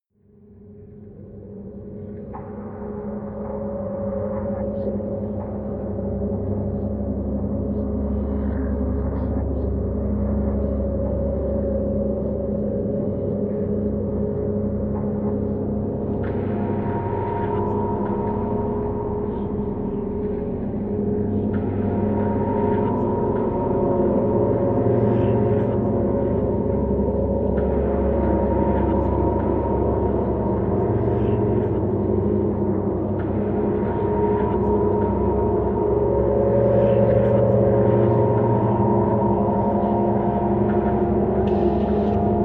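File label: time's running, music elements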